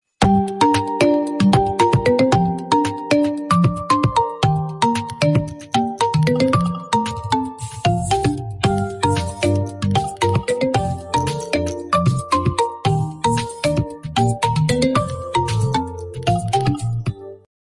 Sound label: pleasant day musical call tone ringtone